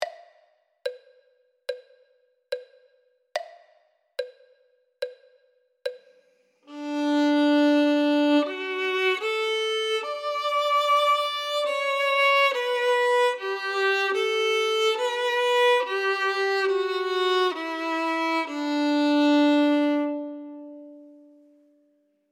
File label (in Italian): violino